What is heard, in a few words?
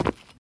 tile, footsteps